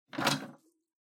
Public Phone Receiver Pick Up Mid

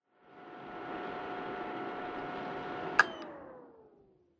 PC Operating Noise Forced Shutdown

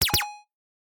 Hit

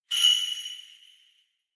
Kagura Suzu

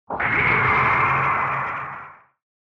Retro Anime Accent High Short

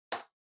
PC-Keyboard (Far-Space-Hard)

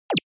Anime Motion High

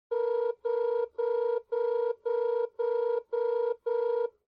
OS Sound Speaker Ring

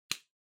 Stopwatch Mechanical Grab

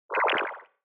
Negative High Short; Onoma; オノマトペ ネガティブ; 高 短

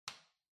Light Switch Box Rocker, Hall Gentle

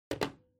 Calculator (Put)

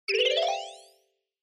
Scene Change Short

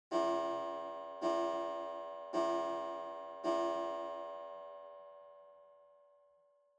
Pendulum Clock Chime Far Long

